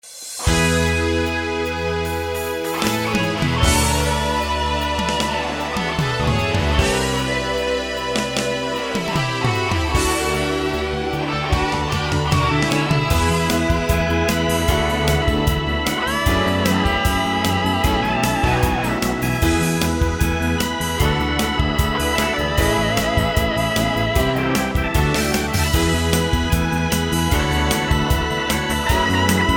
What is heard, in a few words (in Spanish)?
SINTONIA INSTRUMENTAL; ALZIRA RÀDIO